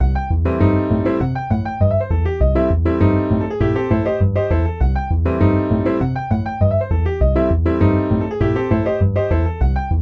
blues deep 100 bpm

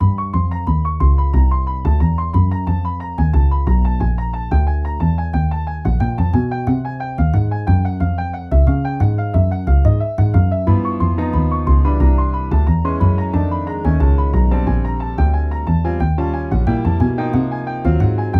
chromatic half-whole step sequence harms

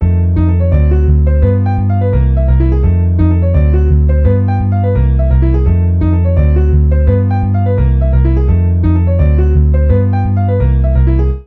ii harms for sound maj

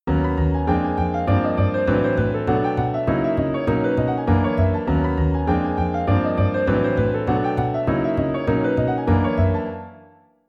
retro steps piano bass

